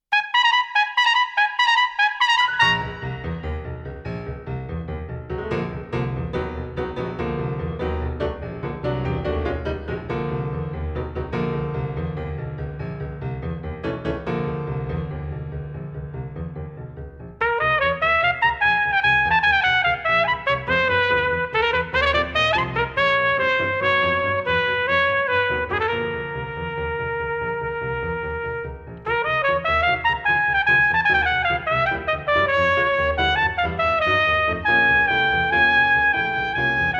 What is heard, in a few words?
Concerto For Piccolo Trumpet, Allegro Con Spirit